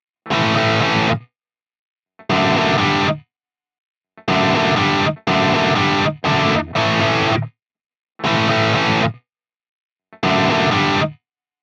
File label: cab on